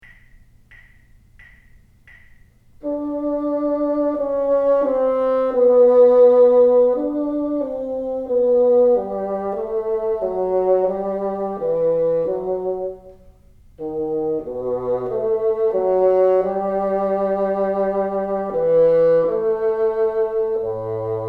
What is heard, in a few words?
teacher, bassoon